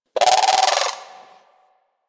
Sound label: Forest Creature Mid Medium; Warframe; Grineer Forest